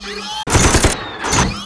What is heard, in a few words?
WAR ATTACK; Mob